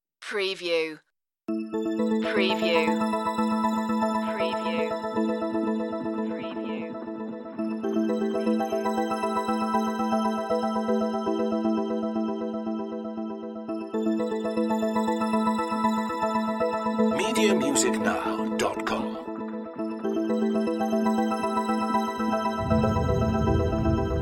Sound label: electronic soundscapes